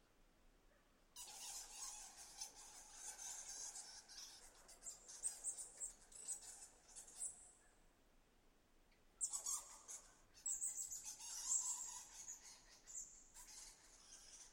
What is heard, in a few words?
Bats Cave